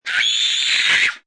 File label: Scream Terror, Scream, 1 child, short, scared, high-pitched, human voice, kid; Screaming; VoiceBox